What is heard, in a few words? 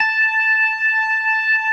CLASSIC C3, Synth and Organ